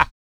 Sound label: KUK, MALE PERC